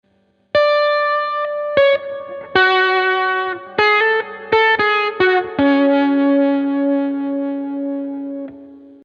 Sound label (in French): impro solo guitare elec; loto sonore